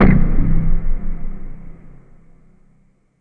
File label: BRYT THUD, Impacts, Hits & Booms; Distored Reality